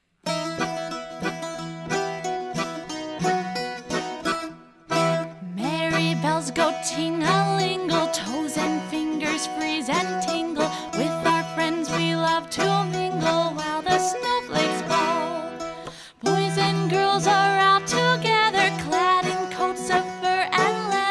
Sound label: voice